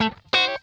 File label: PLUK, Funk Guitar; 90 s Sample CDs; Zero G